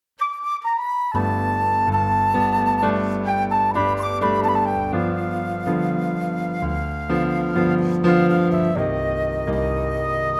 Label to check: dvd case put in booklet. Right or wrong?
Wrong